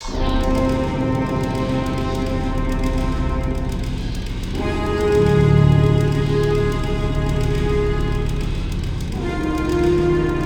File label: suspense